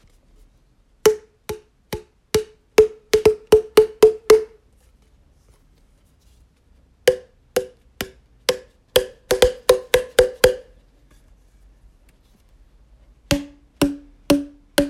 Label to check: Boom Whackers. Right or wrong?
Right